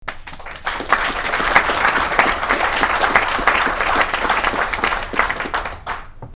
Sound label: applause short